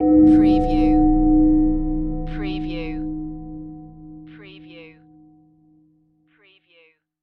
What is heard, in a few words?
Hit Metal